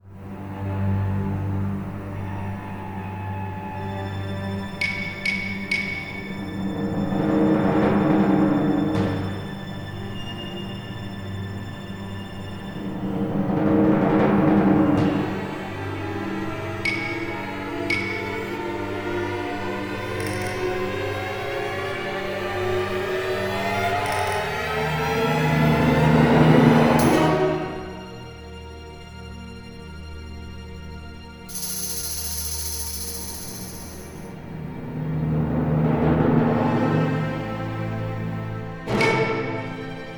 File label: tense